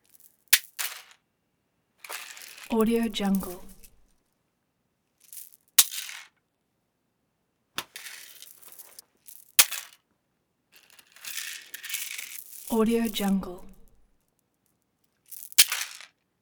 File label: beads pick up; prosoundfx